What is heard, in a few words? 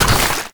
leopard spout